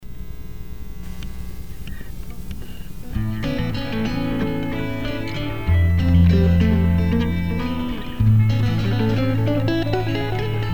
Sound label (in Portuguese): vinheta, violões; violoes e escaleta